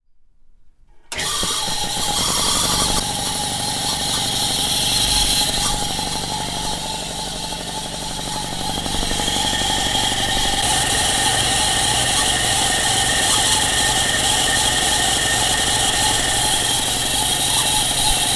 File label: compressor noise